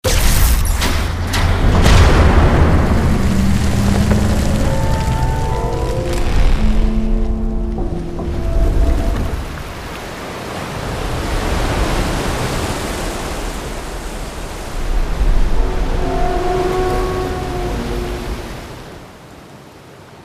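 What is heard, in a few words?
Machine Electric Fence Power Down; the unfinished swan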